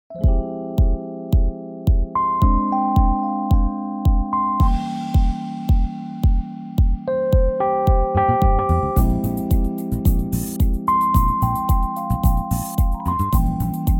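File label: Ab smooth, backing track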